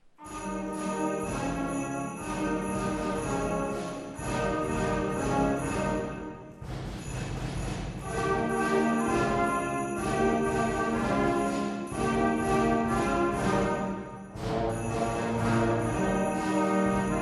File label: christmas bossa nova, Ortsmusik; ortsmusik ollersdorf